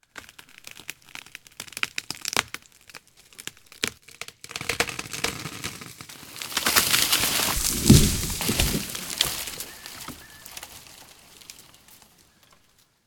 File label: Treefall long and quietish; SyrinScape